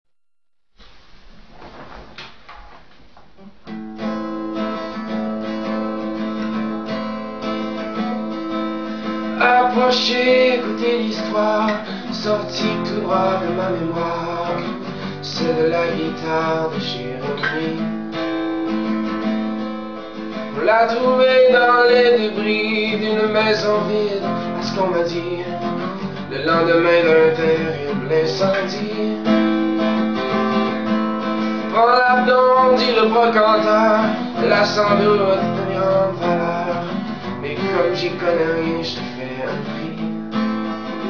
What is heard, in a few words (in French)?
La guitare